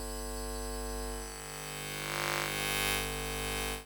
Electromagnetic Fields

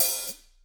HH, Drums; Bob Clearmountain Drums I

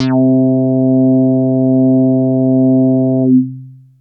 Big Synth Basses

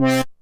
Synth Hit C

